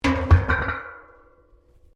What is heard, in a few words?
Pipes Metal Impact Tyre